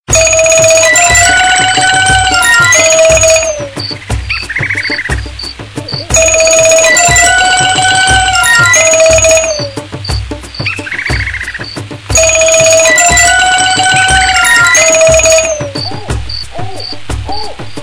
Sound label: tarzan old phone